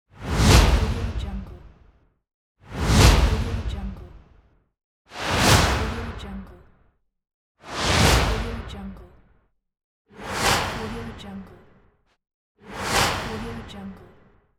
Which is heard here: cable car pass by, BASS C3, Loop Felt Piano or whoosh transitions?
whoosh transitions